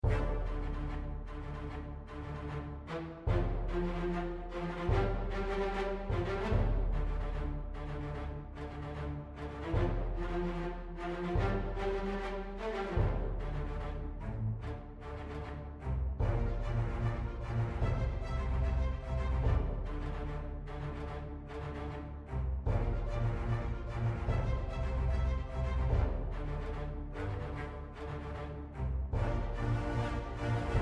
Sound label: bg music; Skillzgaming; battle royale desktop